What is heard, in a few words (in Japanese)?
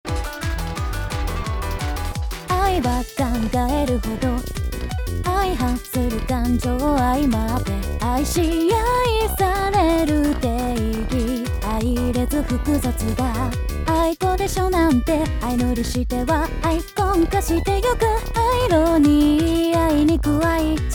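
Synthesizer V, 調整前バージョン, 歌声; 楽曲全体; Synthesizer V Studio 2 Pro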